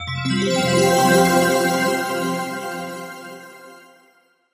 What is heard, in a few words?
Win Jingle; Super Rub 'a' Dub; PS3